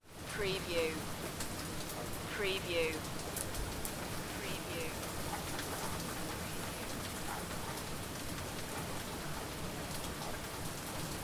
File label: Manchester heavy rain thunder and lightening; beanstalk audio